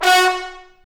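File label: Trombone Section, Brass Sections; Roland LCDP06 Brass Sections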